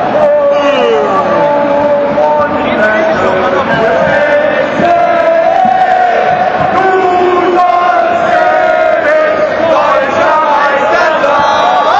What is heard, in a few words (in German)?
Oh du wunderschoene, gesang; SGE